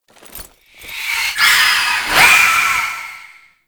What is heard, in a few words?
Idle Agile Rifle; Warframe; Dagath